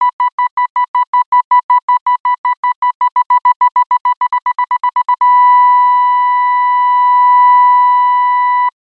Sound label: lock on